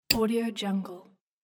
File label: it is pick